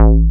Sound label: Juno Sub A0; Proteus 2000; Digital Sound Factory Proteus 2000